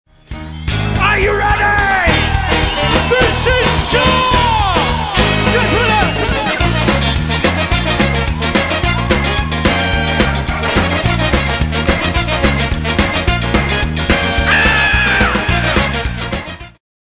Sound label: Agent Ska